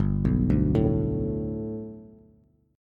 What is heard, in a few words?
G Major Add 9th Bass, chord; Gadd9 arpeggiated; JGuitar